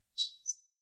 Bird Chirp; SoundsCrate

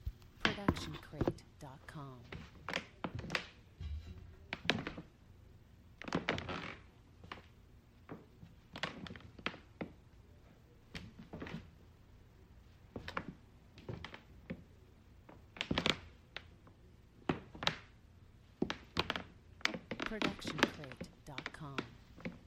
footfall floor creak

